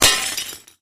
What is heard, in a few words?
hit glass; Gumball - Go Long!